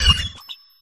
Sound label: cry; frigibax